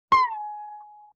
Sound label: Violin Pluck Slide; Vintage Cartoon; Musical Elements